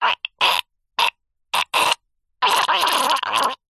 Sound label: human male strangle choke die vocal; zapsplat